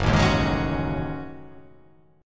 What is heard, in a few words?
F sharp Minor 6th Add 9th